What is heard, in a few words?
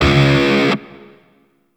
Shawzin Oct One C Sharp; Warframe; Grineer